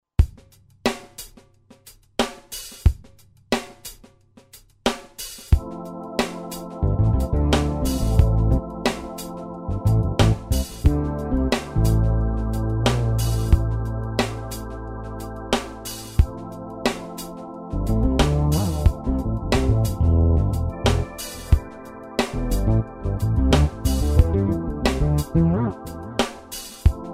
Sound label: Bass SOLO; Roland SR-JV80-10 Bass & Drums